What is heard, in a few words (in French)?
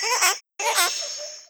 perroquet brut